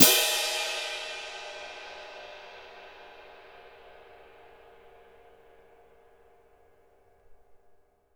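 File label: cymbal, Orchestral; ILIO; Synclavier Percussion 2; World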